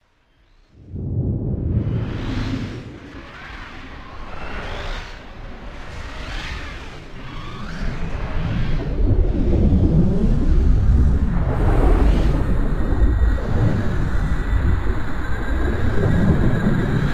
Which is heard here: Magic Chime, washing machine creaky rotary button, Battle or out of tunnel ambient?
out of tunnel ambient